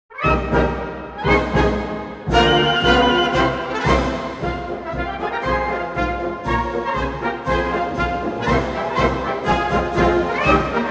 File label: Marsch; Konotop vedma; russia